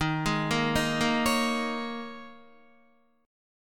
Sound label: Eb 7th Suspended 4th Eb, Guitar; Eb7sus4; arpeggiated